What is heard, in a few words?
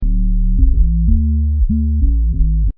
juno, bass